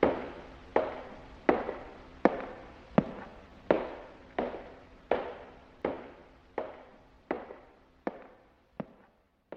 walking away

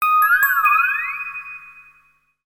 ALERT

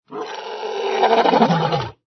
ANIMAL LION

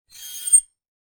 Metal Squeak

